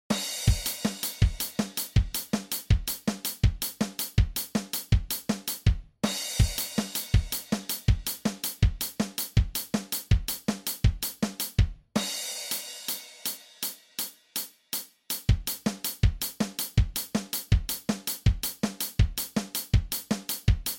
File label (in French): Set de batterie, Percus